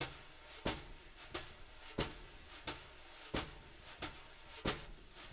BRUSH LOOPS, Living Drums

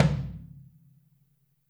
FLTM OH L, Kit OH Mic, Drums Percussion

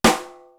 RINGER; East West; Global Sounds

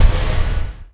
FX Hit; One Shots; Drums & Drones

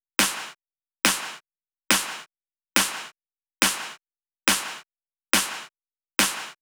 drum Loop 140BPM Full Clap; Vengeance - Essential Clubsounds Vol.5; VEC5 Drumloops; VEC5 Multi Loops 140BPM

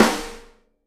SNARE, Live Snares

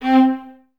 String Ensembles, Violas, VLS Detache

